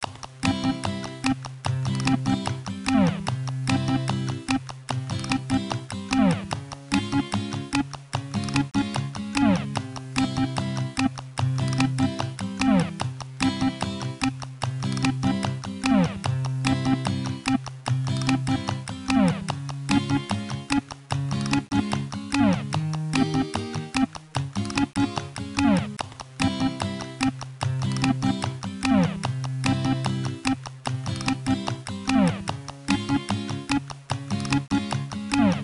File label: Main Music; Spanisch Buddy; NDS